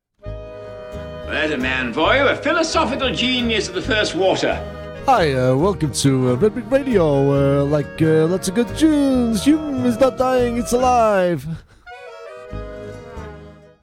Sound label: radio jingle; Manchester based redbricks radio